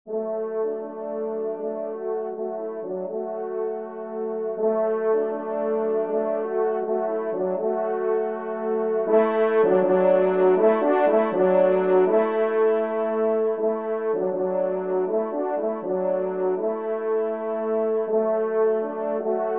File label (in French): Echos Champetres TRP; 2e Trompe; Viney